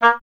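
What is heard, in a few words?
Wind OBOE A; Miroslav Vitous - Solo Instruments VOL-2; Solo lnst. II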